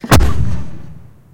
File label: atgm punch